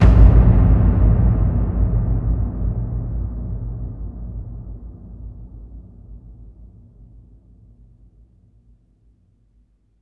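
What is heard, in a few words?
BIG BOOMER, AMBIENT DRUMS; Spectrasonic Distorted Reality 1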